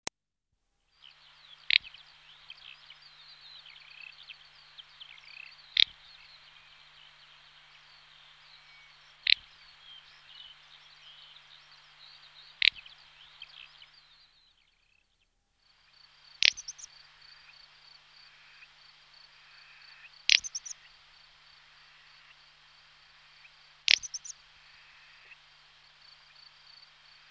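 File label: strepet golos, little bustard voice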